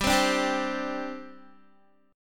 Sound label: G Suspended 4th, Guitar; Gsus4 strummed; 14,15,14,12,12,x